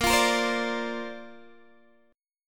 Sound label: Bb Major Add 9th, Bb, down, Guitar, DADGAD